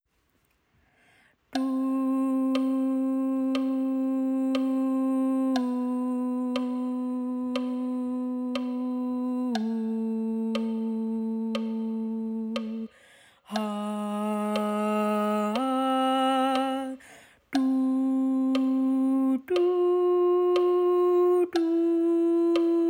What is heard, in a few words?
Alto